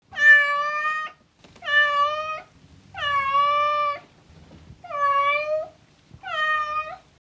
Miaou, Sms Sound Effect

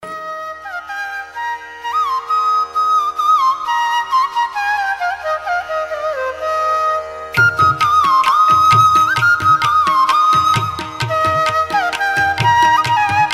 Flute, Hindu Bhagti Ringtone

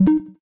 System Error, ui; Mi Mix 2S Stock Ringtones

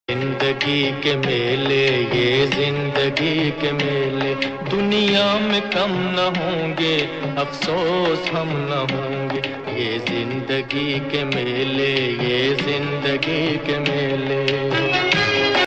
Hindi old Sad Ringtone; MELA; Hindi Movie Ringtones

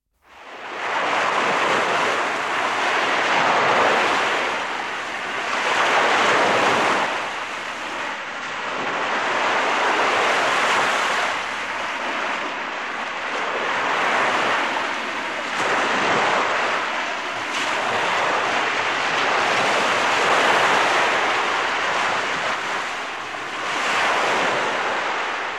Beach, 3D Effect sounds

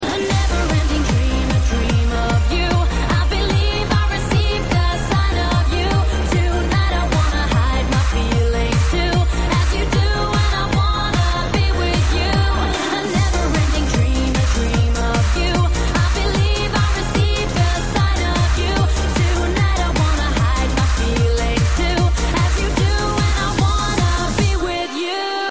club ringtone; Funny

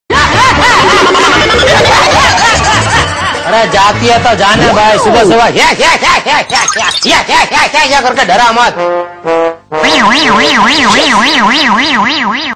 Funny Laughing; Dayaben To Jethalal; Sab Tv Comedy Serial Ringtone; Comedian of Sabtv

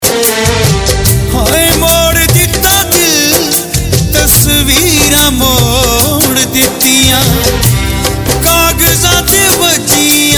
Sad Ringtones, Punjabi

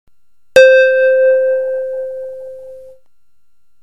Bronze, Alarm

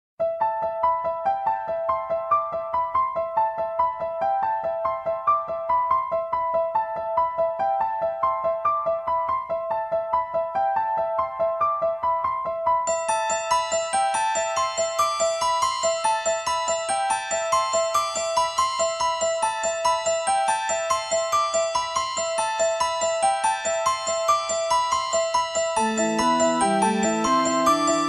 iphone 5 original, Iphone Ringtones

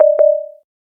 Water Protection, ui; Galaxy Note 9 Ringtones

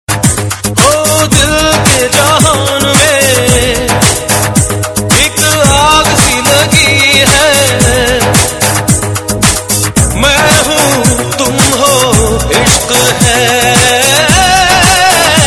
Hindi Sad Tones; Hindi Movie

